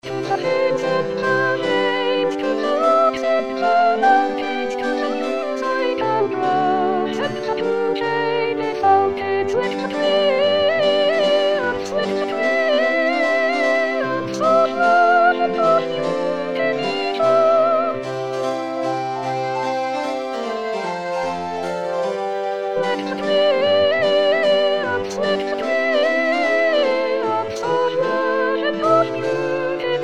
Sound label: to the hills, Soprano